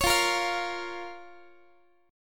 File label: F Suspended 4th Sharp 5th F, Guitar; Fsus4#5; strummed